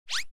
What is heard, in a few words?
bullet